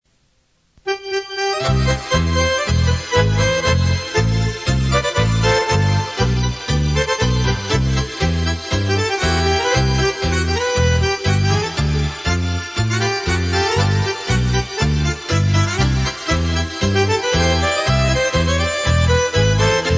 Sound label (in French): tango